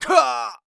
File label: fall; sura